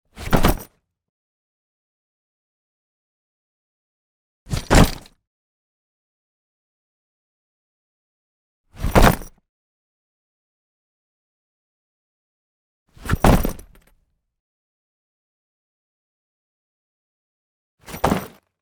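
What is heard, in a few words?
bag falls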